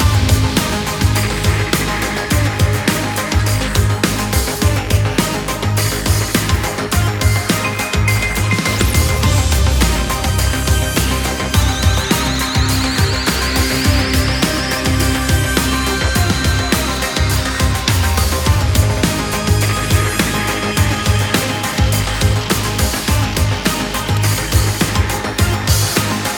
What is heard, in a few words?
Loading Loop; F1 2015